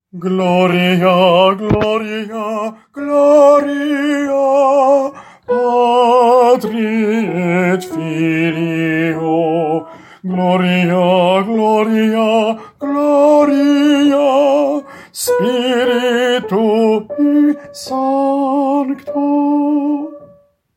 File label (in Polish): SOPRAN; Gloria Patri